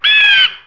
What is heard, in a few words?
bluejay, animals, birds; KABOOM! - Wild and Wacky Sound Software